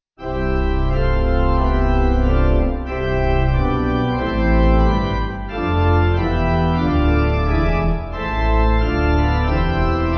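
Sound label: Acclam Acc Pipe, Pipe Organ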